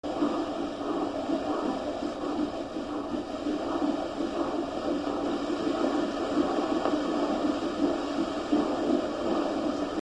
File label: baby heartbeat